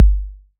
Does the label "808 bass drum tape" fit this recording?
Yes